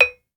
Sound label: BRONZE, Bronze Gamelan